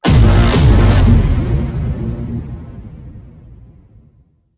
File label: Powerup Collected Stinger